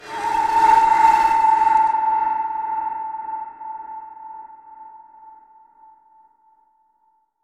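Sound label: Eerie Jungle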